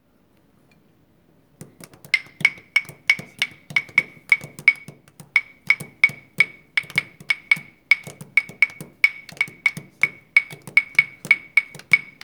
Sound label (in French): Percussions